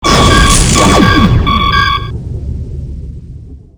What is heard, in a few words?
shields fail